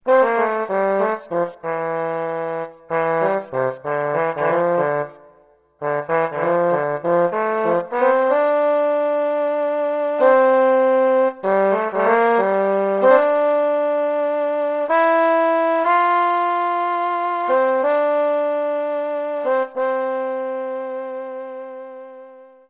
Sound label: instrument